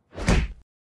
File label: punch